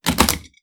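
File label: Suitcase Click Open Squeeeek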